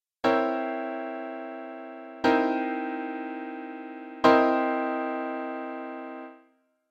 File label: Chords for Various Epsilons